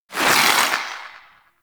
Protea Fan Shrapnel Projectile By; Warframe

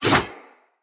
Sphere Dual Sword Whoosh; Weapons; Player; Swords

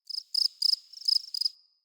Forest Open Glade Cricket Close

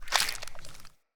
Foley Jump; Enemy; Characters; Corrupted Sproutfolk

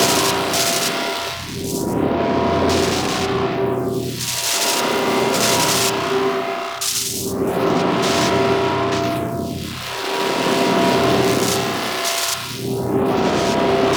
Echoes Dead End Crystal Loop; Ambience; Gameplay; Warframe; Entrati

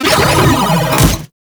Mutalist Speaker Start Scream; Warframe; Proto Infested; Abilities

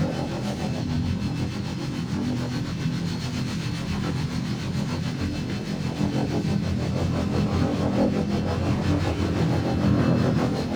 Ship Idle Loop; RailJack Ship

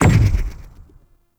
Noctua Shot Punch

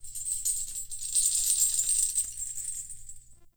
Binaural Chains; Ambience; Warframe; Shadowgrapher

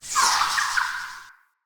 Flock Projectile Whizzby; Enemy; Abilities; Thawtide Hunter; Sinecure; Ranged Hunter Thawtide

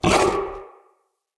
Battle Dog Attack Bark; Animals; Vocals; Snc Battle Dog

